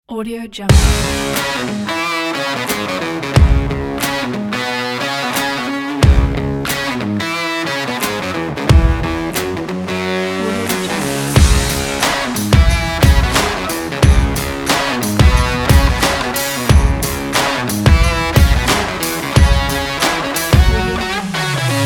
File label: indie rock country rock